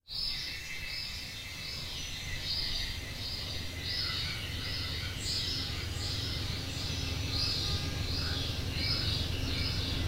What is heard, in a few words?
beating a dead piano with birdsong train